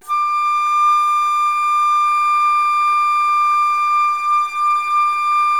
ALTO D5, ALTO FLUTE; USB Soundscan vol.23

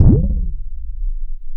BASS, Drum & Bass, 160 BPM

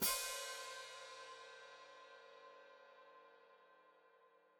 Hat Closed Open, Hi-Hat

